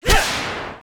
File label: High elf Archer attack